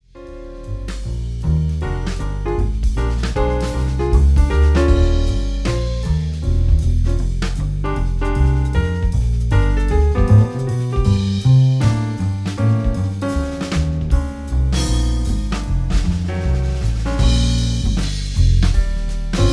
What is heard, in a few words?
jazz trio